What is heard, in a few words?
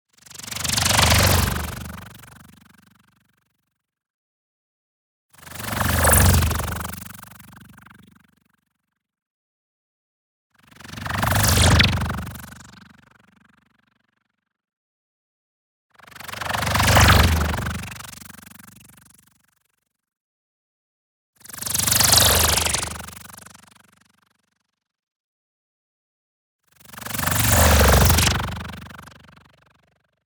NEON WHOOSH SMALL SLOW Fizzing Energy; boom library